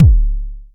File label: analog attacked, Kick; mpk mini presets; Ableton Foundation FreePacks